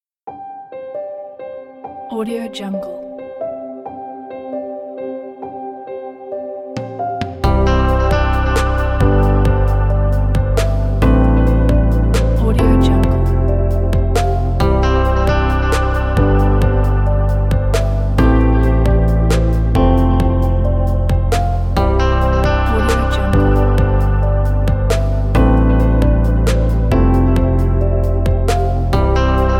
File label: motivational guitar piano